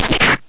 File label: powerful energy gun